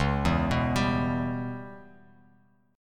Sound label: C sharp Minor 7th Flat 5th C sharp Bass Standard; C#m7b5 arpeggiated; 9,7,9,12; JGuitar